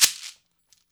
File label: SHEK DRY; InVision Interactive; Percussion Slam; KWICKIE